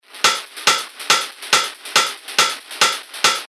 techno loop 140 BPM; Reckoning; revealed techno top loops vol 8

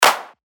Clap; Archery; revealed claps snares vol 2

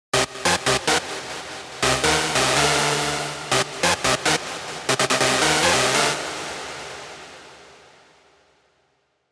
Stab; Stab Teknological; Revealed Serum 2 Big Room Techno Drops Vol. 4

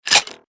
PERC Latias, percussion; revealed percussion vol 2

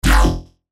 Bass Shots G; Headbutt; revealed bass shots vol 4

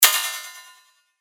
Bulldozer, percussion; revealed percussion vol 2

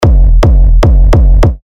Kick E; Boulder; Revealed Terra Kicks

